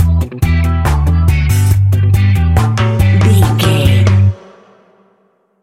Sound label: reggae ska fusion music stinger, Classic reggae music with that skank bounce reggae feeling; Great for summer BBQs